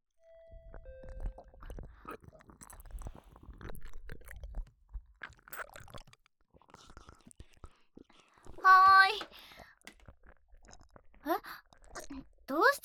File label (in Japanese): 耳舐めされながら幼馴染にディープキス・手コキ・乳首舐め・フェラされる音声; 22分間耳舐めされながら幼馴染にディープキス・手コキ・乳首舐め・フェラされる音声